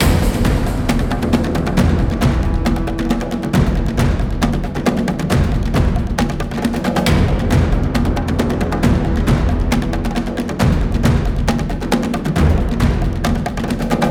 Library Percussive; Audio Animals

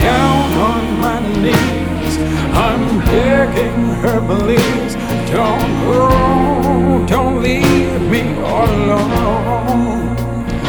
Alt Rock Before

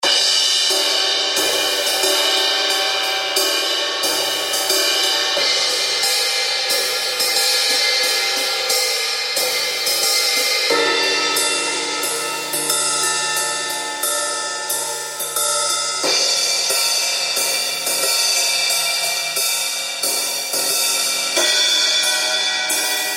Studio Cymbals

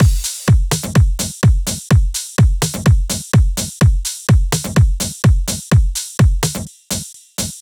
Drum

